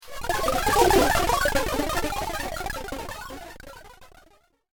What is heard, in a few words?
Bits And Bytes Broken, noise music